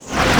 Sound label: magic bail, skater; sk8; Tony Hawk's Project 8; XBOX